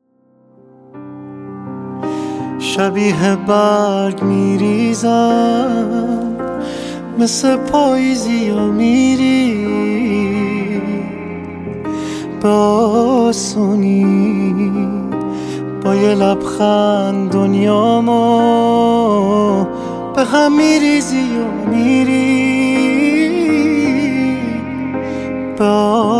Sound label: Piano Version